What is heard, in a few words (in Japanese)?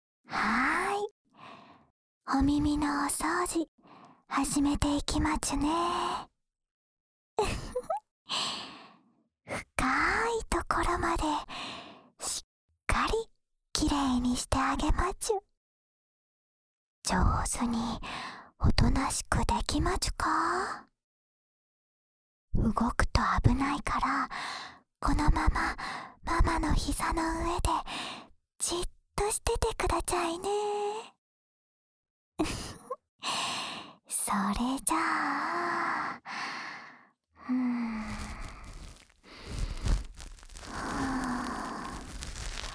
安眠用耳かきループ（左耳, ASMR